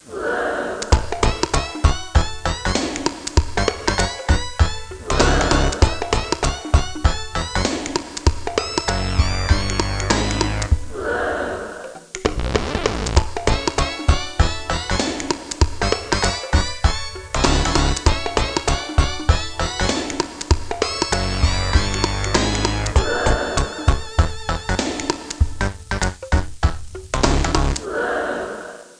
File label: theme; Great American Vacation Guide